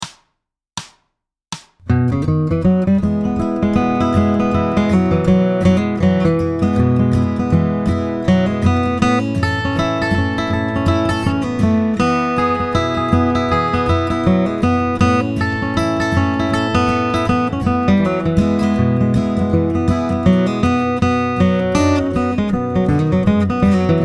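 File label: Guitar, Bluegrass Guitar; common song, some cross-picking but a bit of everything here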